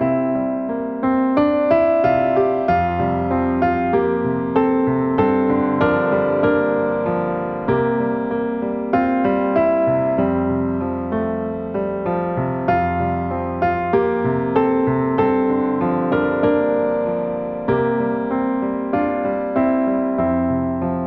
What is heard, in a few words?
accomp